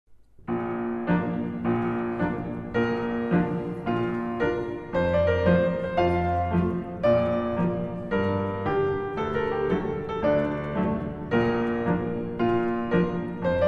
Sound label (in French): Choeur Des Soldats